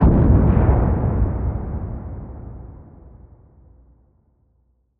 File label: big one shot